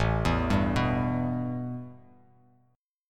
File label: A 7th Suspended 2nd Bass Standard; A7sus2 arpeggiated; JGuitar